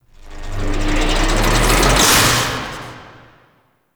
Spawn Machine Electricity Zap; Echoes; Warframe; Entrati

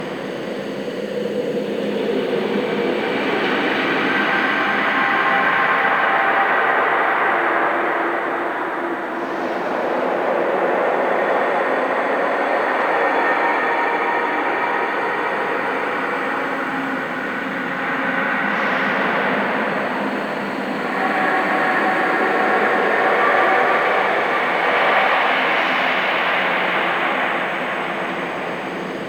Galleon Interior Ambience; Warframe; Grineer Galleon

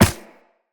Run Ice, Footsteps; Characters; Operator

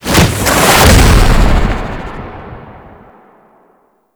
Jetpack Sister Spawn In; Warframe; Grineer

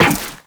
Step Zipline, Footsteps, Walk; Warframe; Characters; Tenno

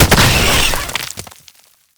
Gestating Tumors Spawn; Gameplay; Warframe; Nineteeen Ninety Nine; Deep Archimedia

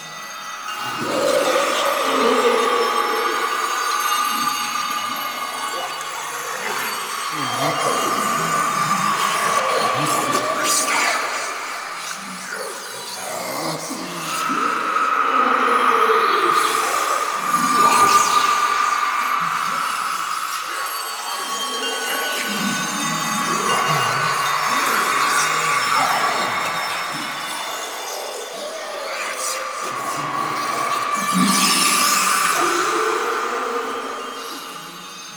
Veil Loop; Mission Three; Narmer; Warframe; The New War

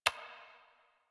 Computer Keyboard Stroke; Warframe; NineteenNinetyNine; Messenger

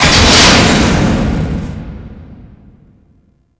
Shipwreck Rocket End; Ambience; Gameplay; Grineer; Warframe; Tau

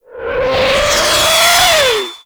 Artillery Whizzby; Enemies; Abilities; Warframe; Teralyst

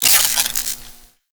Mag Spark; Archwing Intro; Cinematics

